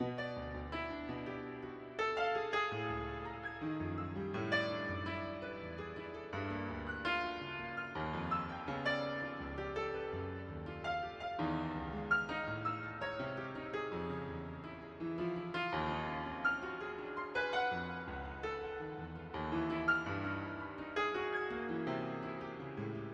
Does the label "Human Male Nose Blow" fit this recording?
No